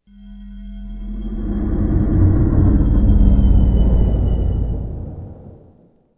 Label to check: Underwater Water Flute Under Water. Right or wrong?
Wrong